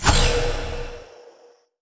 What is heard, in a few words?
UI BOOM NOTICE; Monster Jam Steel Titans